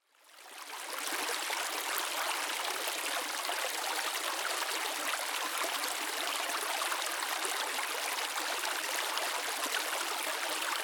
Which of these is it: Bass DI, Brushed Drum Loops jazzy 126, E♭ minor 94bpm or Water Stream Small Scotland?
Water Stream Small Scotland